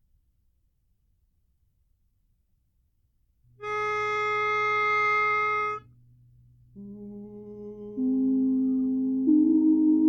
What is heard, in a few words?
Jingle Bells Lead; Christmas